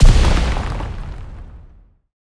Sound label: ui refresh